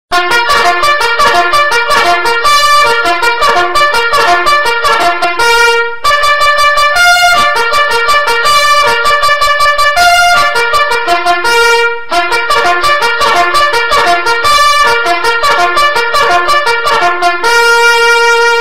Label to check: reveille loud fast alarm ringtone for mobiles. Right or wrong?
Right